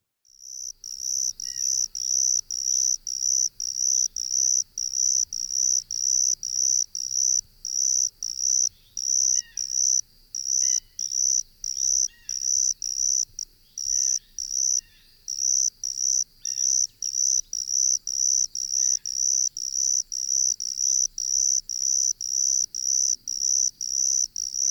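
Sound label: confused ground cricket, Northern Illinois Insect Calls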